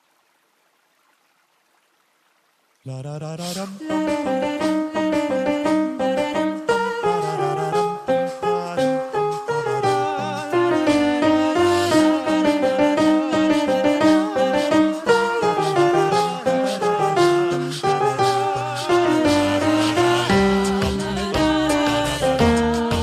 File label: Carol of the bells